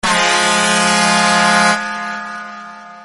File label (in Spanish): efectos de sonido bocina camion